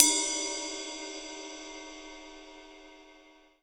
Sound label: RIDE22 EDGE; Big Fish Audio; Ross Garfield Drums VOL-2; SHILOH KIT